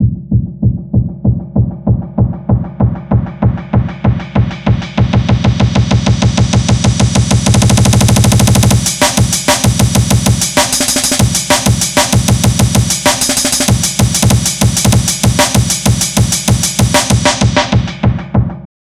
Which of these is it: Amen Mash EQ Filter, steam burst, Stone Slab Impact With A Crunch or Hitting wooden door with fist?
Amen Mash EQ Filter